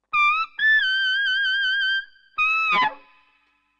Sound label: Whammer